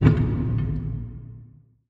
continue Button; netent

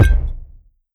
win Refill; netent

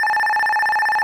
Splash Wheel Spin; netent

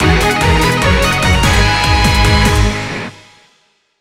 big Win Loop End; netent

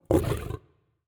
lion fx low

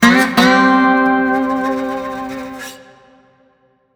large Win Riff; netent

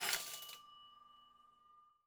count Up End; netent

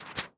real reel bounce; netent

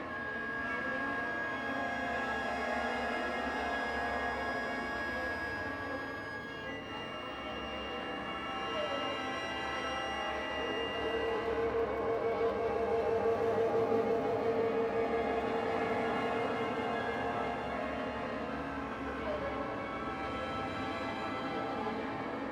scatter Win Loop; netent

